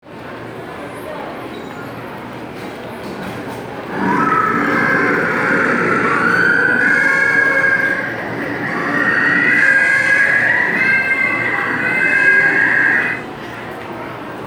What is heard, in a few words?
little blue penguin